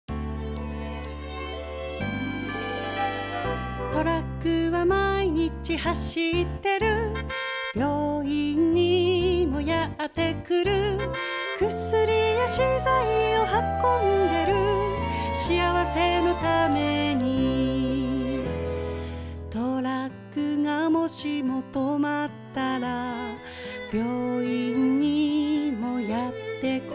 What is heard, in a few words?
dance music; トラックフェスタTOKYO; ダンス動画; 課題曲